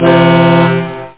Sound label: Truck Hor; travel